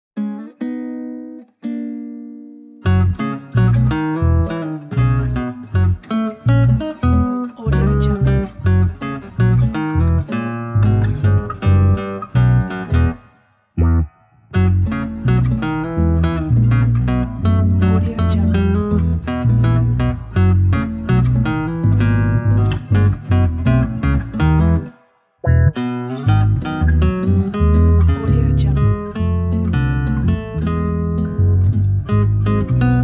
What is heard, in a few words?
whimsical retro